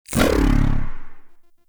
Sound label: UI Corpus Theme Grid Open; DE; Warframe